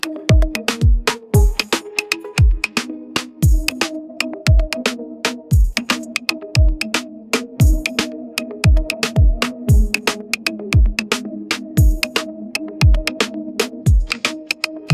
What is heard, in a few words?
ringtone afrobeats